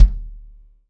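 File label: 3x Kick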